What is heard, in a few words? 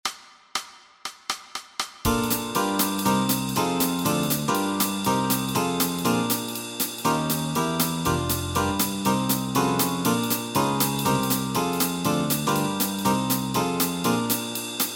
Backing Track, walking bass